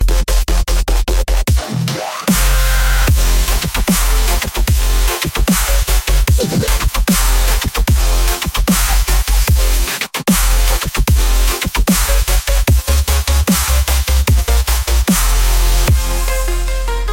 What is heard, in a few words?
electric heartbeat